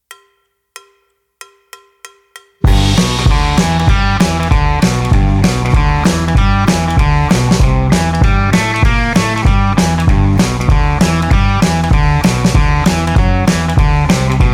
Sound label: Guitar Boogie Shuffle, E-Gitarre; Schule fuer E-Gitarre